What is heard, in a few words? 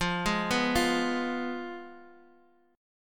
F Diminished; 10,8,7,8